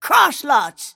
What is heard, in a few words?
move pain; snapfire